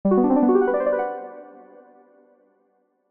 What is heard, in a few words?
tone; Valentines; seasonal